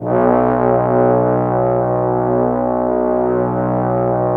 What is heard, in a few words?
Trombones, B1; Standard Instr